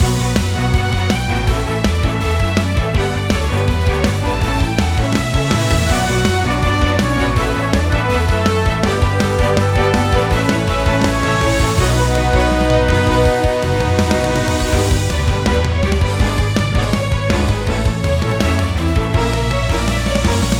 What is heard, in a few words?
BGM; IdleGirl